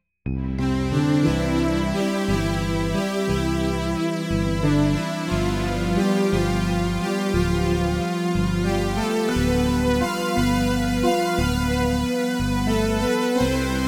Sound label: Schneewalzer snow waltz traditionell